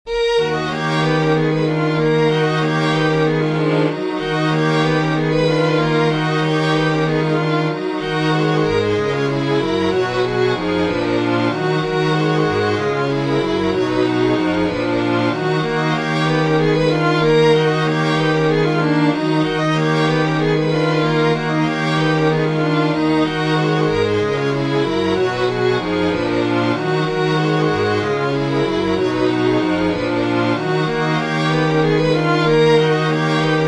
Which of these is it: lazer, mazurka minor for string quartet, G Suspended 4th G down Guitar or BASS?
mazurka minor for string quartet